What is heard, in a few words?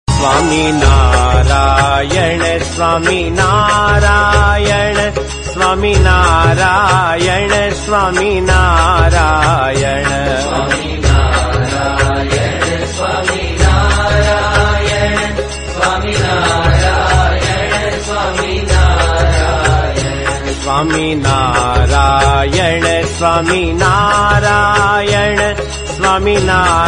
Swaminarayan Swaminarayan Bhajan Ringtone